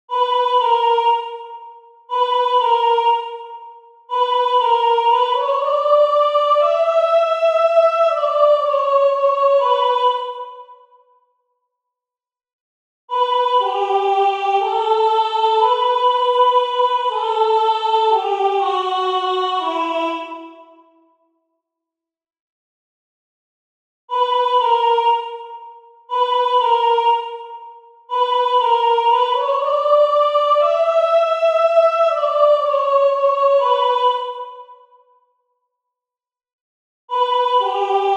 Soprano; Bach; In Todesbanden (Cantate 4); Versus II; Baroque

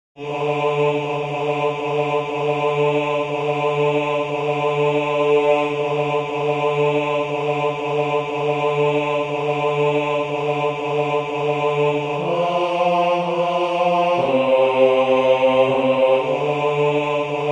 Messe solennelle Et Expecto Bass; Gounod

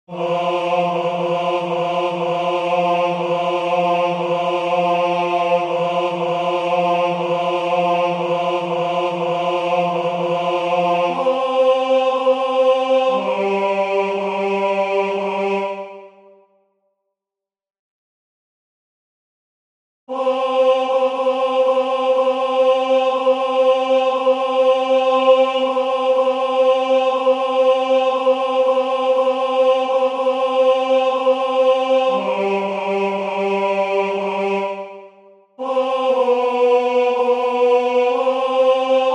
Messe solennelle Et Expecto Tenor; Gounod

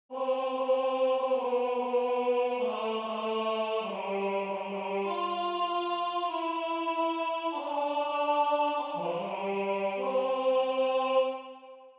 Gloria Tenor; Domine Fili; Vivaldi; Antonio Vivaldi

